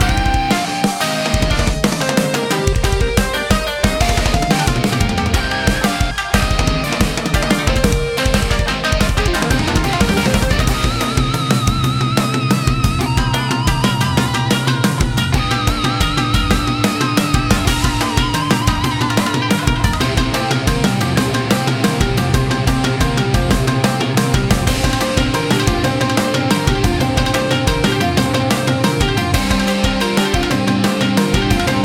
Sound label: dialog; shadow-girl